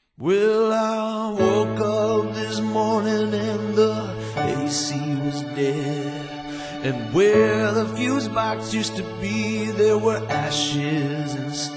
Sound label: jingle; champagne